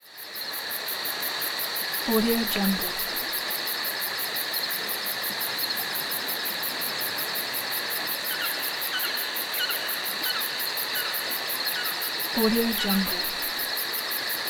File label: night forest ambience